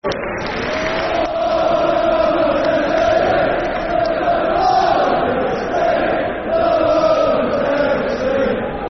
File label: And It's Bolton Wanderers, Football Chants; Bolton Wanderers Bwfc